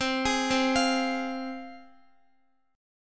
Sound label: C Suspended 4th Sharp 5th C, Guitar; Csus4#5 arpeggiated; x,x,10,13,12,12